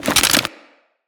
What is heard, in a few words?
Grenade Pickup; Weapons; Grineer